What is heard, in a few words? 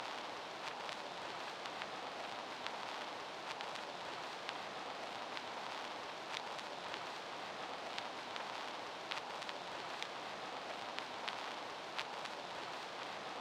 Faked 78 Noise